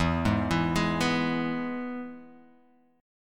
E-Major E, Guitar Half Step Down

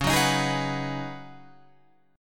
Db 9th Suspended 4th Db down Guitar Open F; strummed

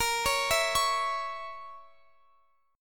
A sharp Diminished Guitar Open F, chord; A#dim; arpeggiated; x,x,22,20,19,20

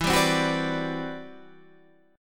C Major Sharp 11th E

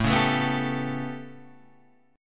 A 9th, down

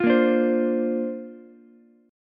Bb Major Add 9th, Bb, down, Ukulele, C6; strummed; JGuitar

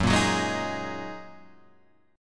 F sharp 6th Add 9th F sharp Guitar Standard; strummed; JGuitar

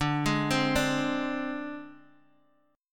G sharp Major Flat 5th D, chord

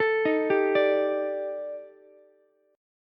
Eb Major 7th Flat 5th, Ukulele; EbM7b5; arpeggiated; JGuitar

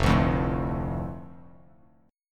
F 6th F Bass Standard; strummed; chord; 1,5,3,2; JGuitar

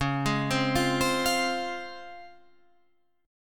C sharp Major 7th C sharp Guitar Drop D

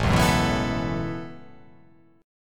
A 6th Add 9th, A6add9 chord; strummed

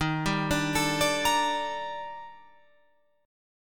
Eb Major Sharp 11th Eb Guitar Open F; EbM#11; arpeggiated

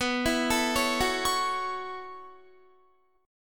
B 9th Suspended 4th B down Guitar; arpeggiated

